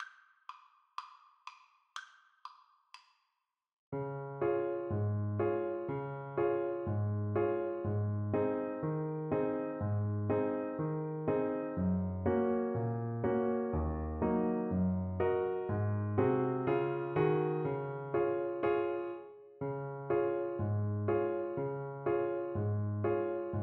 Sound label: sailors hornpipe, Saxophone